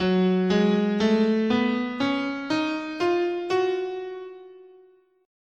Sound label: Gb Melodic Minor, scale